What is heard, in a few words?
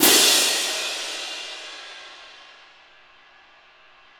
Cym Crs; Digital Sound Factory Virtuoso